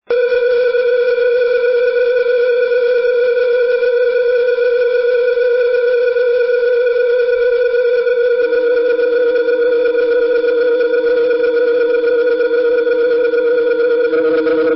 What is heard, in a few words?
Pipe Organs A1